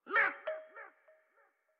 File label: Mech Aggro; StyxMech